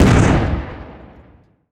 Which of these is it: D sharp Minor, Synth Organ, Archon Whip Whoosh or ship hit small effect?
ship hit small effect